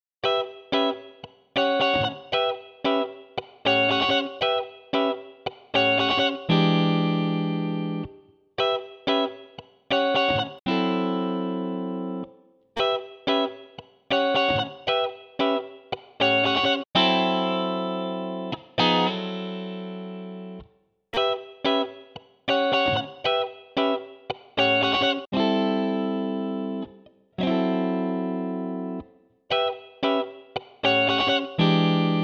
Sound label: Blue Funk